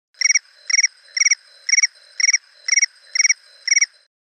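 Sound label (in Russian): звук ночного червя iphone сообщение напоминает материал звуковых эффектов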